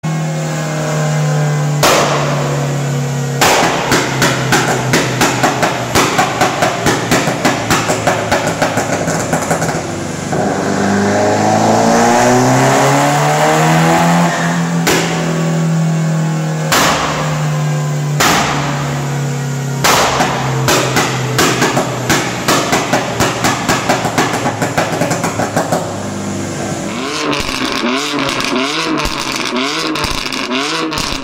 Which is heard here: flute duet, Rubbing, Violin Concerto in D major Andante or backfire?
backfire